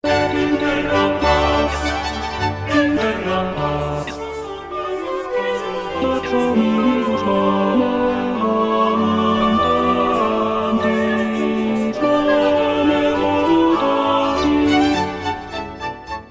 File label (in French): Gloria tenor; Stage Zelenka 2023; zelenka zwv21